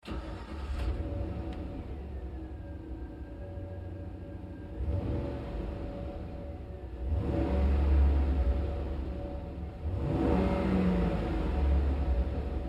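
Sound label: Truck GMC Savana Interior Start Revs Off Lite; Trucks; Big Room Sound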